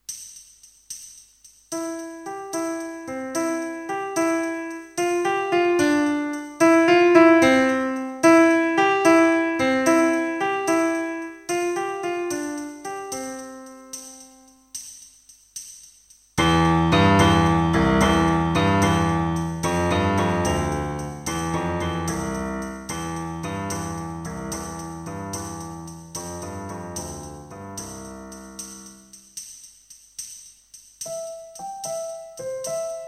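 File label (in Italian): esercizio crescendo diminuendo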